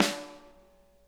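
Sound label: center, snare; Sonic Reality; Interactive Drum Kits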